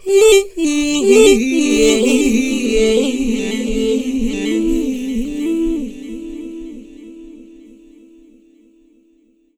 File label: MACHINE R, AUTOTUNED, Funky Vocals; USB Soundscan vol.18; AKAI